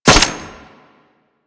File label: Nail Grenade Shot; Warframe; Trapper